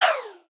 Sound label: synth laser; retro synth SFX